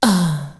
FE AHH R, Vox & Voice

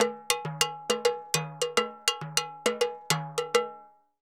Timbaleta 136; Best Service; Festa Latina VOL-1; Drums & Percussion

